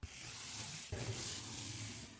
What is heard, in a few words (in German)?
Geräuschewerkstatt Sturm kurz; Klangexperimenten